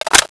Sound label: BAR clip in, weapons; dod